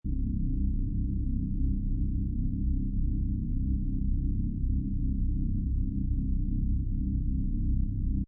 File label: Space Drone Loop, Dark space or onboard spaceship background noise